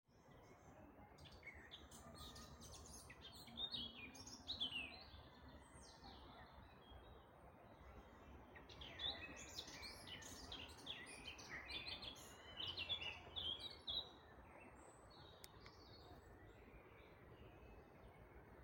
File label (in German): Moenchsgrasmuecke; Stunde der Gartenvoegel